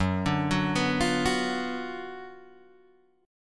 F sharp Major 7th Suspended 4th Sharp 5th, F sharp, down, Guitar, Open G